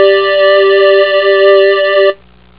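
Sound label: square fifths, instruments; sonix; Rise Of The Dragon; chipmusic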